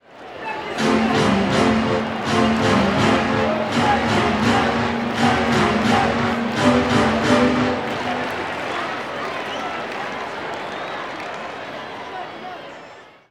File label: Baseball Organ Crowd Claps; Crowds